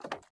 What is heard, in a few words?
fs creakwood, footsteps